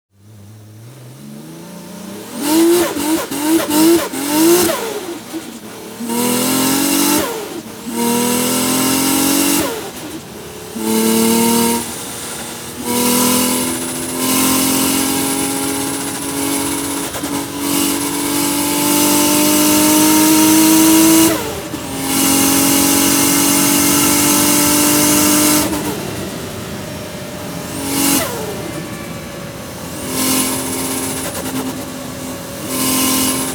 Boost Sound

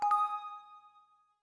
Windows Exclamation; Glass

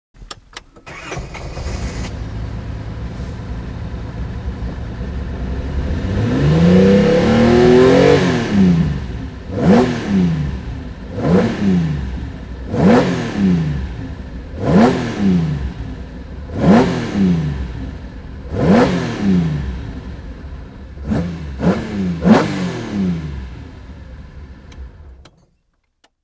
Ignition Exhaust Sound; Initial D 5th Stage Sound File; Initial D Music Lossless Collection

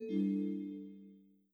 Windows Battery Critical; GMM Windows 10

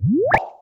Start Button On; The Jackbox Party Pack 2 - RU

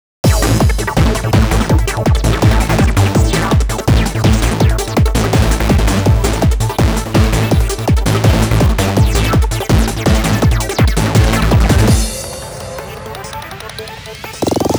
SF Pure Analog Synth Mix

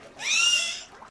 dolphin death; Indev+ 0.3 Portable

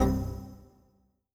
Windows Critical Stop; Pearl

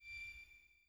Speech Disambiguation, Windows 10; GMM Windows 10

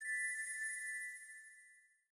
Windows Ringin; GMM Windows 10

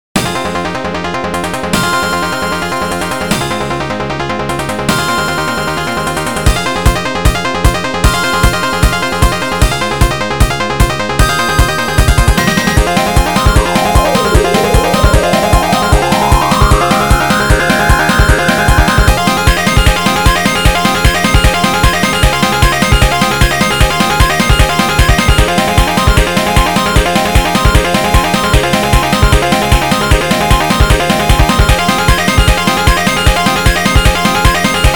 Love of Magical Chimes; Akyu's Untouched Score vol.4

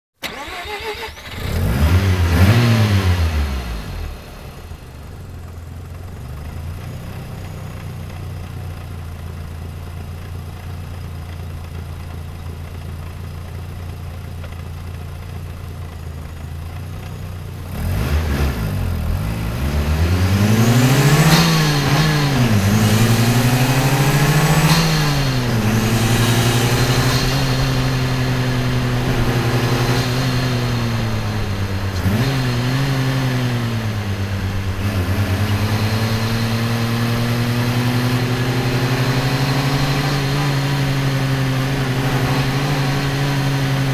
Boost Sound

